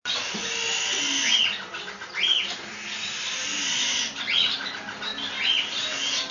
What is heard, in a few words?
FireTail Diamond Finch